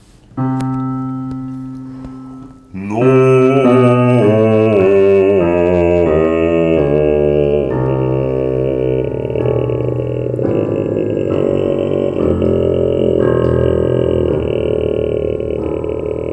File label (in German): singt Subcontra G